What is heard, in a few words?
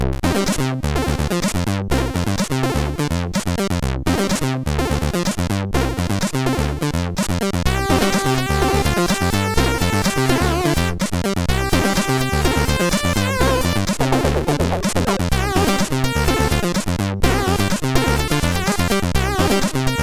Slappy Funk, MOS6581R3